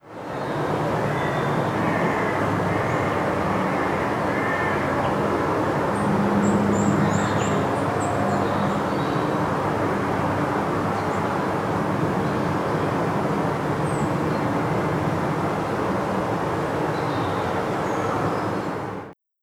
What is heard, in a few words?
paris Park; Cities